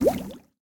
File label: Water Shoot